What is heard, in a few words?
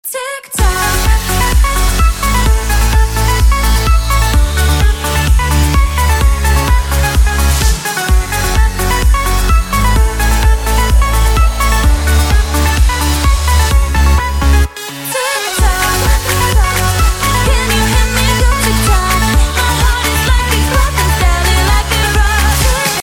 remix ringtone